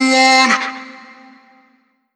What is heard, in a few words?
Omnivox oneshot C, Vocal; One Shots; Slate Digital Omnivox Vocal Sample Pack